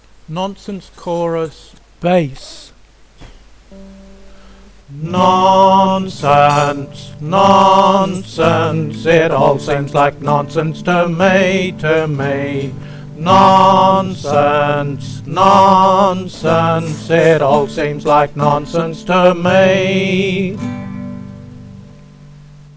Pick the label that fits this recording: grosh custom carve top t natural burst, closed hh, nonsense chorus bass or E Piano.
nonsense chorus bass